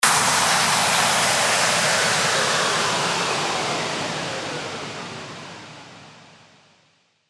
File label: WHOOSH